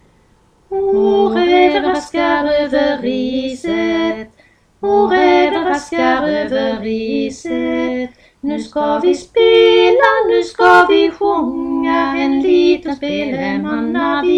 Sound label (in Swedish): A raven raskar over riset stamsang